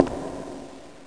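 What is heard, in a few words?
step ground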